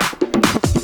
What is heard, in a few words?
Fills, Loops 140 BPM